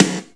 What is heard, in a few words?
short snare f sharp key